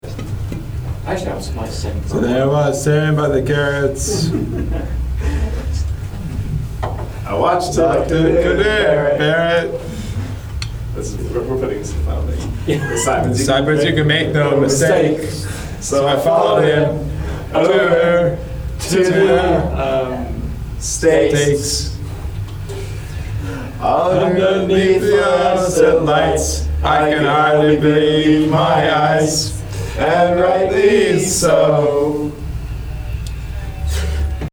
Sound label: acappella